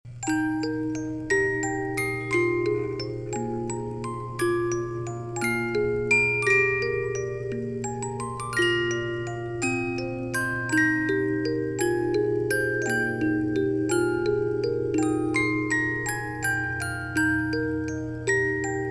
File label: waltz 30 note, musicbox; skaterz